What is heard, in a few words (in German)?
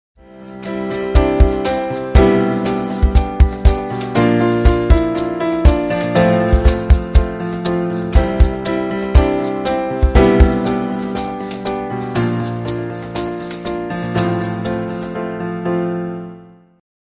Jingle; Projekt10; steinhundert